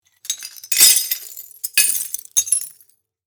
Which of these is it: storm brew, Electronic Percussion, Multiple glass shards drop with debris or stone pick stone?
Multiple glass shards drop with debris